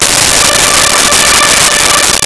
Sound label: Strings D5; Nintendo; July 2020 Gigaleak